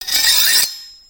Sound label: sword unleash